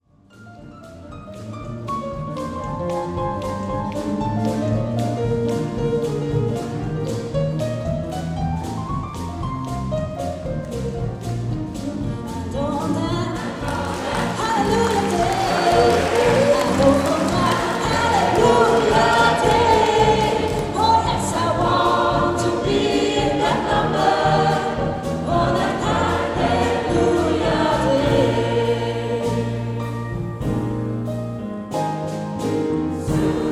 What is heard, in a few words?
Gospel Medley, gospel chor